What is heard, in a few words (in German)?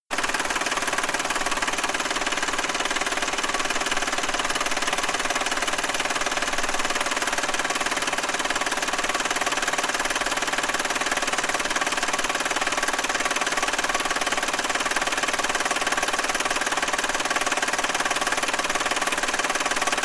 Klackern warmer Motor